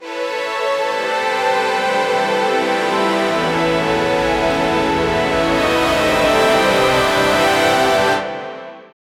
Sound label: dramatic entry, intro